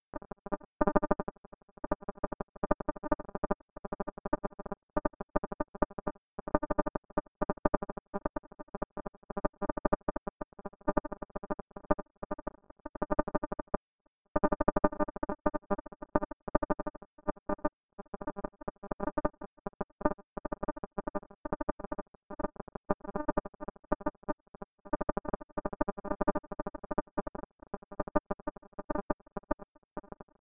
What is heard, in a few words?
EEG